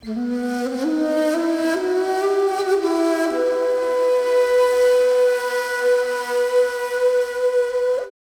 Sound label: SIERRA FLU